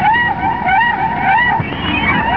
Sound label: VULTURE; sound effect; Dieren